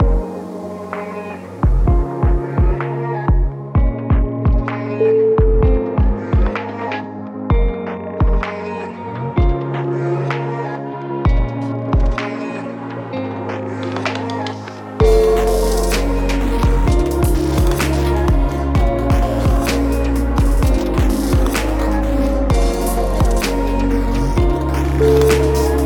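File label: glitch dream, music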